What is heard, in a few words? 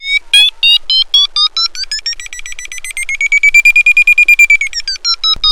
Vario fast ascent